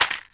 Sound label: mag drop, weapons; cz75a; cstrike; csgo